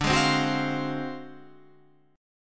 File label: D 7th Sharp 9th Flat 5th D down Guitar Half Step Down